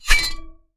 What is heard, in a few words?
a deep metallic thud